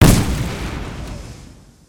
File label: Charge fire